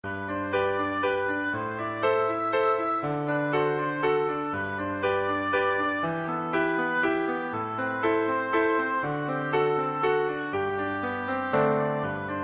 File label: bike oboe